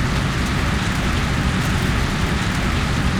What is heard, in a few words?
road roll wet, Tires; Kart; AOS RF1 Kart Demo